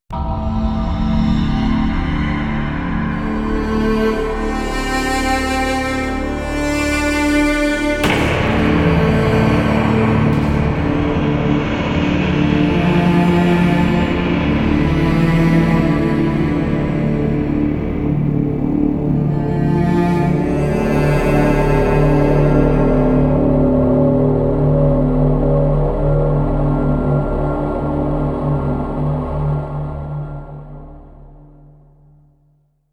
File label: Easter Egg Stinger; Zombie DLC5 - Tomb